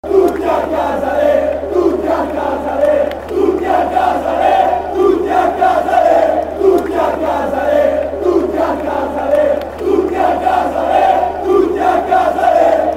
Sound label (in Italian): Tutti A Casa, cori curva nord